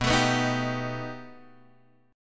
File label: B Augmented 7th B down Guitar Open G